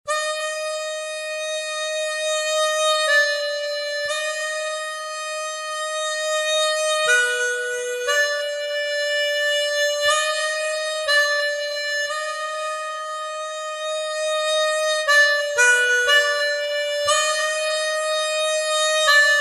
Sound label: Chromatic Harmonica; Chris Hein